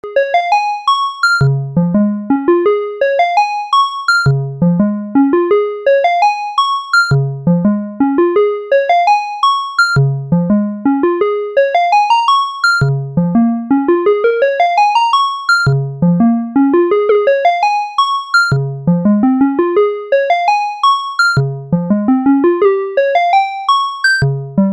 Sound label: quantizer, synth; modcan; analogsynth